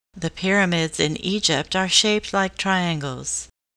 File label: triangle